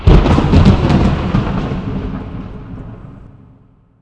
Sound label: Thunder; The Elder Scrolls III - Morrowind